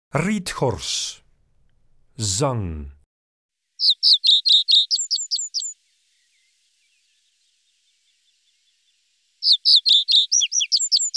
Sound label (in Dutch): Rietgors, Zangvogels; IVN